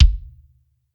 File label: Kick